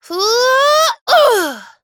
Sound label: ring; dqxi; serena